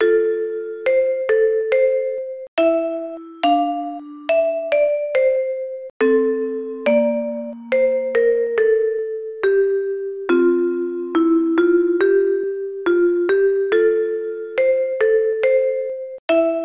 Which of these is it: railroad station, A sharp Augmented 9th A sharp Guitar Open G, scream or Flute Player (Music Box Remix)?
Flute Player (Music Box Remix)